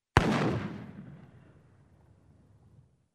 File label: gun